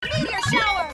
meteor shower

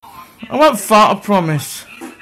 wont fart

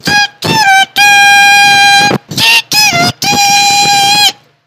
tudududu

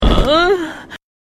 aughhh

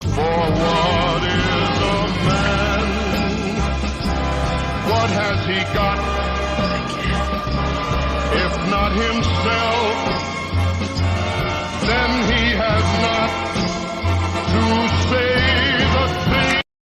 crying; danny devito

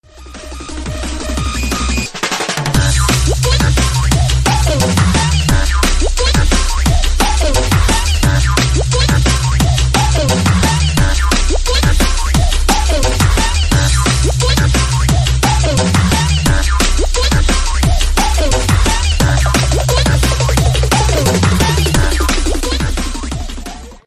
music

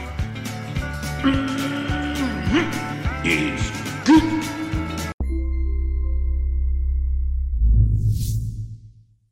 mmmhm

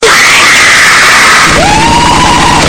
ahhh

